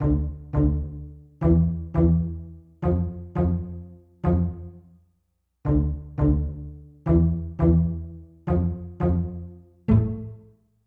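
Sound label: Hiphop R&B Low Pizzicato Dm; R&B Funk Soul; Digidesign; Pro Tools; Pro Tools Essential Demos; Essential Loop Demos; Demo Session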